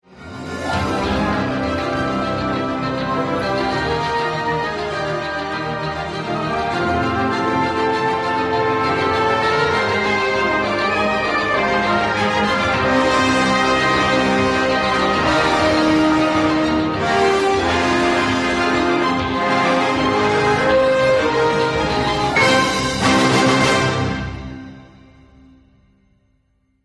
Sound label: Loop Main Menu Extended Major Variation; tales from the borderlands gamerip 2015